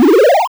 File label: Powerup; Final Platformer